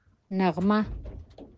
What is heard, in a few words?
melody